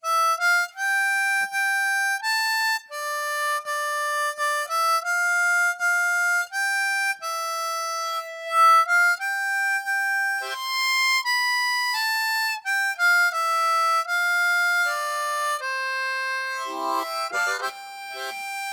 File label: Folk Song, Blues Harp; Scheenflцcken